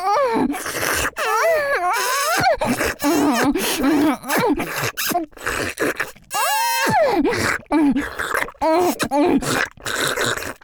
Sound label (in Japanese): フェラ; ギャルフェラッ!!学校は援交ギャル風俗店ッ!!